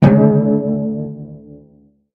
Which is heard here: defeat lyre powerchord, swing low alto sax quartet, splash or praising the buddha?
defeat lyre powerchord